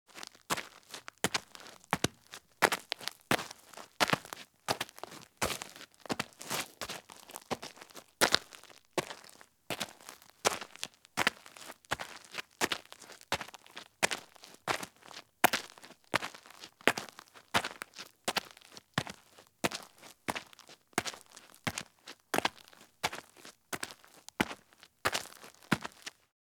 Women's High Heel Shoes Walk at Fast Pace on Roadside or Driveway Gravel; Sound Ideas; Footstep Sound Effects